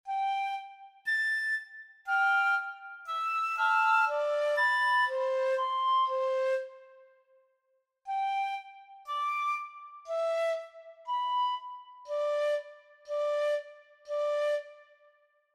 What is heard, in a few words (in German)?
Wiki Melodie